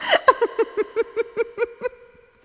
laugh; Sonic Foundry (Sony Creative Software)

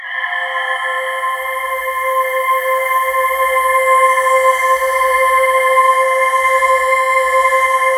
JV feedback, JV-1080; Syntec; Wall of Sounds

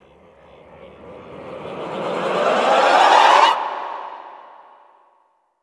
SWELL MED, Classical Choir; Best Service; Extended Classical Choir; SWELLS

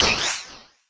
Oneshot; Sonic Foundry (Sony Creative Software); Esoterik Beatz; Warsaw

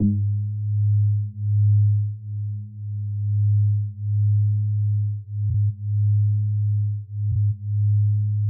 G#2, SYNTH BASSES; Music Store Sound Service; Einstein

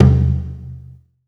MAL PERC, MALAISIAN; USB Soundscan vol.60; Asian Traditions; AKAI

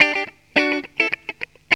GTR, GTR RIFFS, Funky Elements; Zero-G

